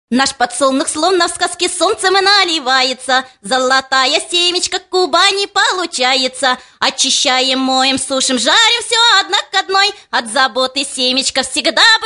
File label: Narodnyi vokal, Golosa; Abakan